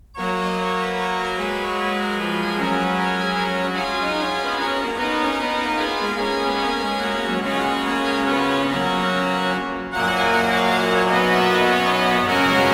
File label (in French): orgue, grands jeux